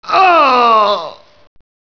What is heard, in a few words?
die; payned